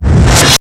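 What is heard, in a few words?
Boss DR-202 percussion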